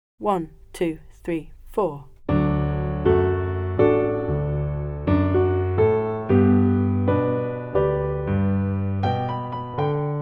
backing

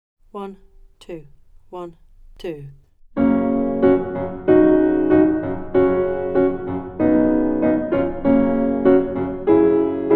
Mattachins F sharp backing

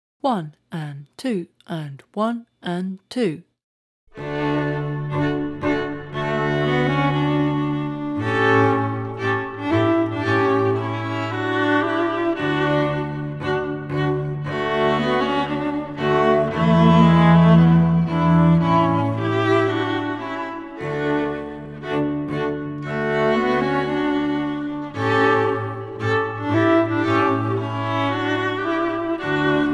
Theme from Schuberts Trout Quintet backing

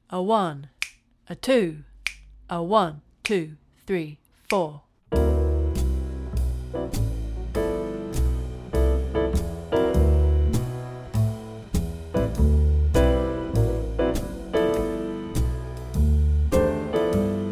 Blues impro backing

